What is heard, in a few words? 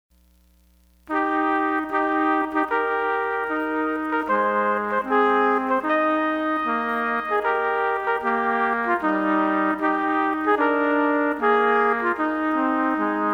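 O Holy Night 2 trumpet; Trpt Duets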